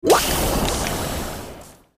effect burst pickup

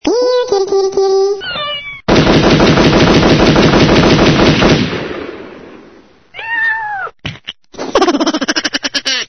kitty; loki sounds; hl2dm

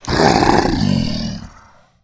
alert; agrunt

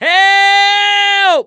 fail; freak fortress 2; ellis

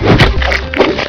splat; freak fortress 2; meatboy

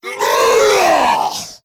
attack; tf; slender; shaolinshuffle

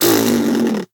blerp; hat kid; a hat in time

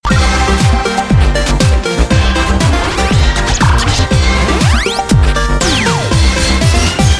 speed up; TF2Ware; Warioman

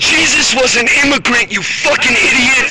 alert; slender; shia labeouf

